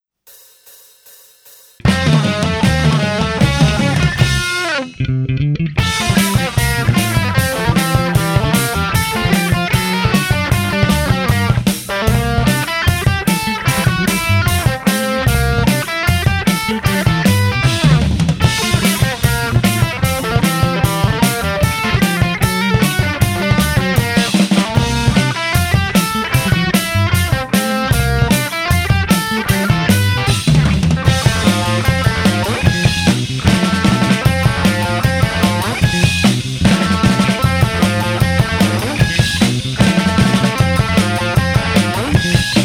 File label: gt left